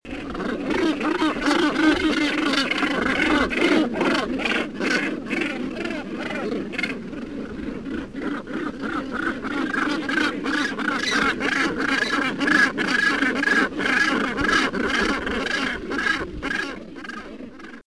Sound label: Northern Gannet